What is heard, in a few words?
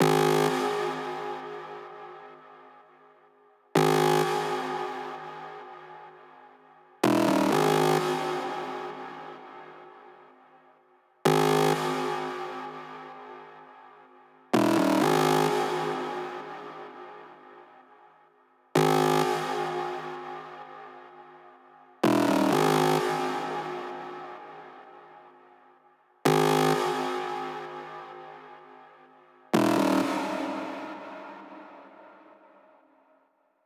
128 BPM Saw Add No SC, Bassline, Root G; Vengeance; Essential Clubsounds Vol.5; Demo Constr Kits; Big Line Kit